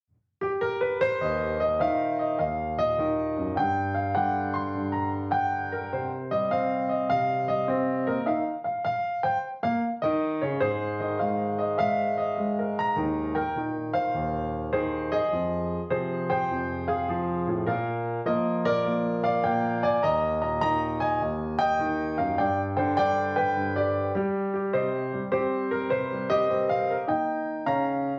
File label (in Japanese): ピアノ; ジングル